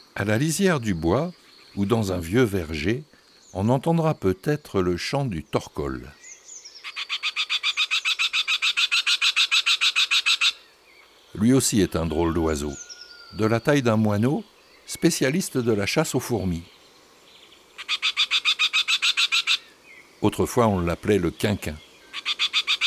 Juin Le Torcol fourmilier, chants d'oiseaux

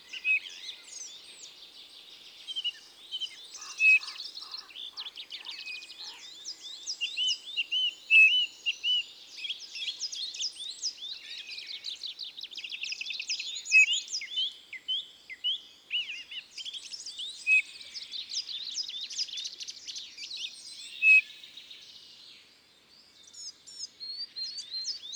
Bouvreuil pivoine; ABC des chants d'oiseaux

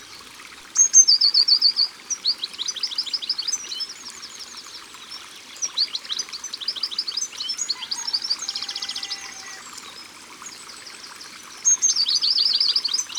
Mesange bleue, Au bord de l eau

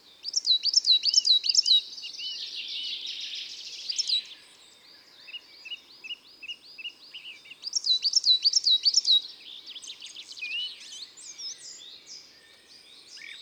Mesange noire; ABC des chants d'oiseaux

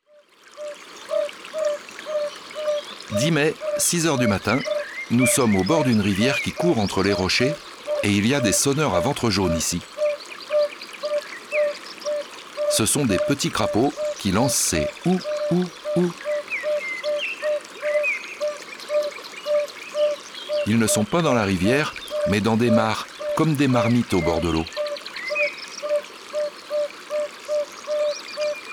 Sonneur a ventre jaune; ABC de la nature

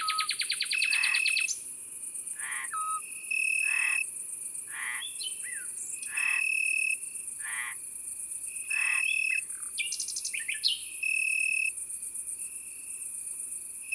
Rossignol philomele; abc de la nature

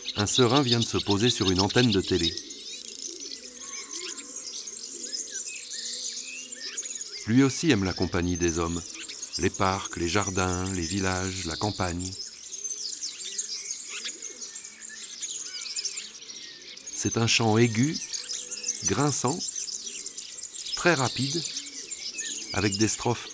Serin cini; ABC des chants d oiseaux

